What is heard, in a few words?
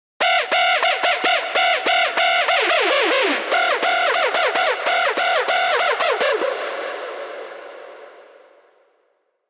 Screech; Screech Panopticon; Revealed Serum 2 Big Room Techno Drops Vol. 4